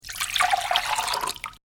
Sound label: Filling Object With Water; Pouring Sound Effects